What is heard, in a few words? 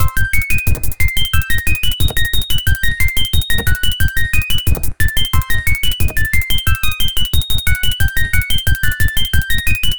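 looping 90s arcade attraction, chiptune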